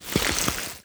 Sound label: close, zombie blind, foley; creatures